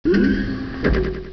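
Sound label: DROPEN, door open; forsaken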